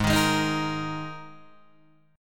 G sharp Suspended 2nd, G sharp, down, Guitar, DADGAD